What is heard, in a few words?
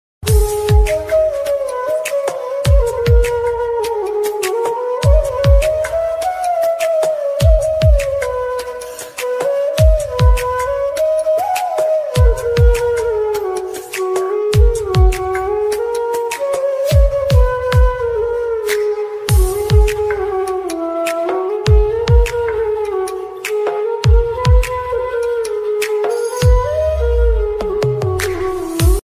new ringtone flute music ringtone